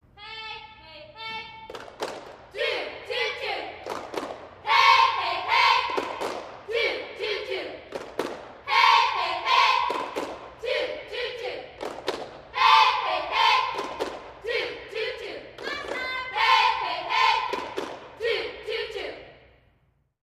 Cheerleaders High School; Cheer 3; Hey Hey Hey, Two Two Two Interior Reverb, Teen Girls